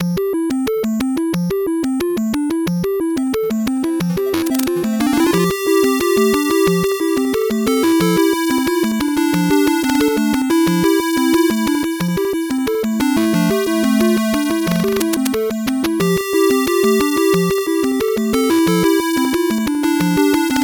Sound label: 8bit bgm normal